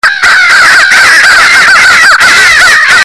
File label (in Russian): crow vse suda; data crow